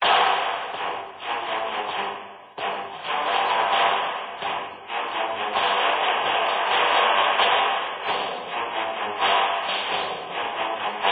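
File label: Music Ambient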